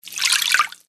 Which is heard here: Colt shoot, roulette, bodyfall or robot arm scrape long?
roulette